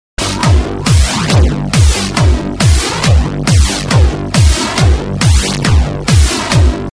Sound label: New Bass Ringtone